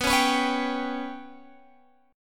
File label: B Suspended 2nd Sharp 5th down Guitar Open G